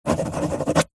office pen ballpoint paper hollow table sign signature autograph; zapsplat